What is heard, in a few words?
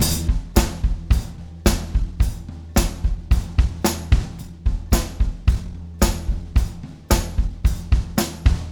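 beat, Room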